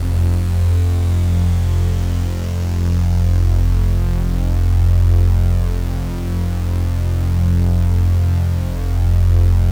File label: SIZZLE F2, SIZZLEPAD; Drums In Your FACE!